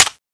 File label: clip out; tfa cso; gilboa